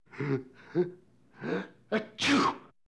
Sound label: man sneeze; קול של התעטשות